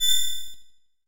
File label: menu hit; Luciano NM